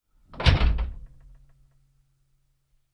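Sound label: Cutlass Interior Door Close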